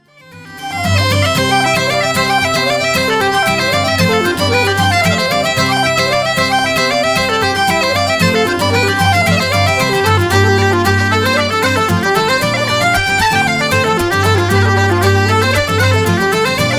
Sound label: Cape Breton Wedding Reel, Roaringwater Reel; Killarney Boys of Pleasure